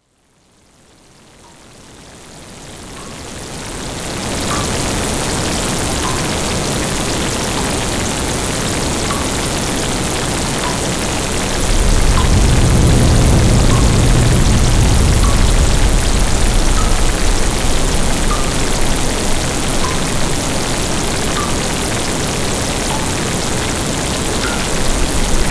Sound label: rain and thunder